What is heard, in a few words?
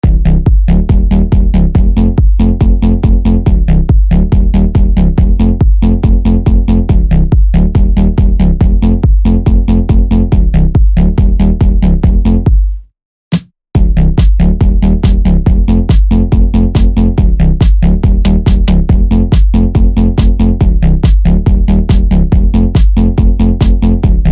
beep beep; weeklybeats-2020